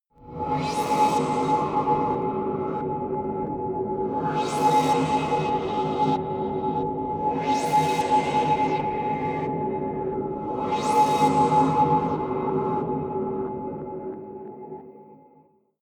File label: sci-fi mysterious universe